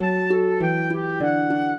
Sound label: minuet, flute harp; Mozart